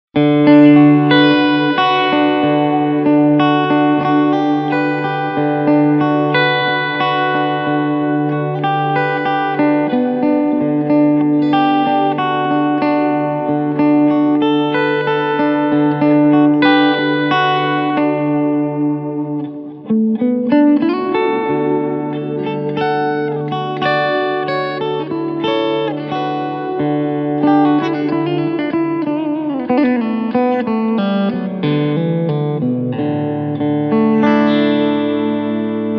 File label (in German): Hall